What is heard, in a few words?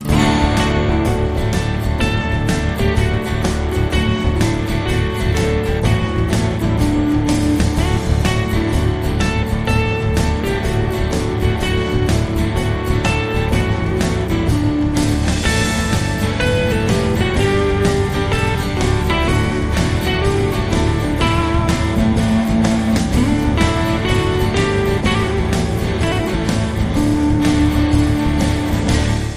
Angels We Have Heard Irish Rock; Trad (PD)